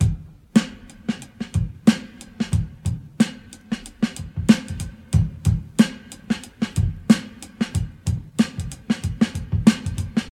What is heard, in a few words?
92 bpm drum groove g sharp key, Free breakbeat - kick tuned to the G# note. Loudest frequency: 724Hz